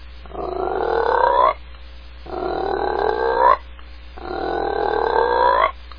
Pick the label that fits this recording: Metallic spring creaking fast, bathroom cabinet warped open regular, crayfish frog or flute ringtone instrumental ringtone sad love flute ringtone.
crayfish frog